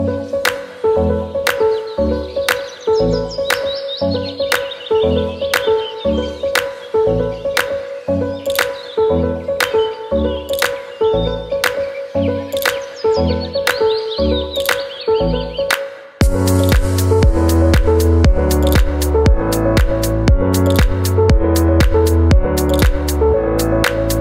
Cool Ringtone For Samsung

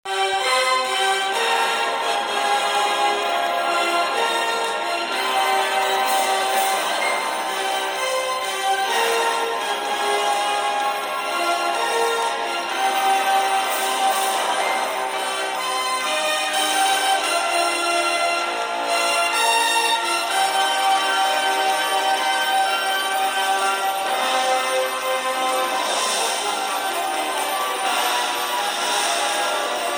Classical Saxophone Ringtone

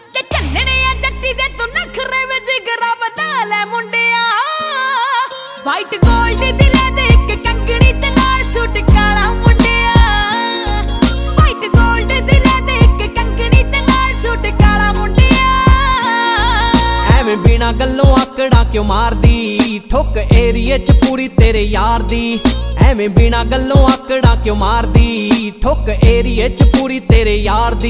Punjabi Ringtone

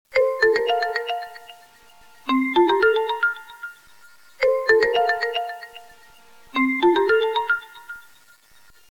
Huawei Tune Default

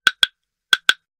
Clue Notification; Samsung S21

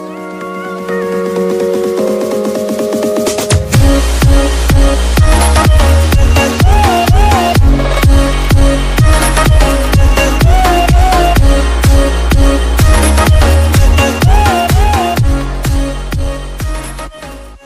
iPhone 4s Ringtones

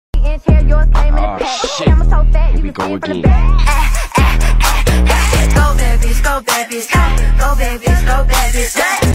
Remix TikTok Dance

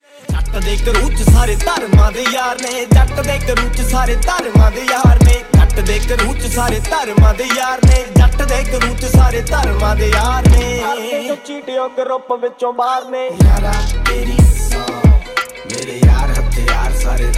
Punjabi Ringtone

